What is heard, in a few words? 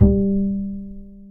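DBL HARM G3, double bass harmonic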